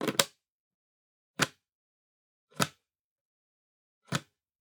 Generic Button Plastic Small

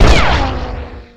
Gun Sniper Rifle Shot; Weapons

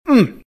hurt; RadwinIsland

